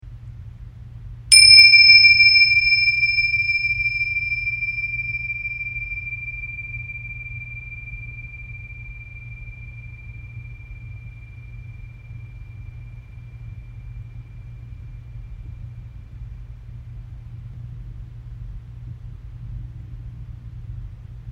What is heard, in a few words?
silence w bells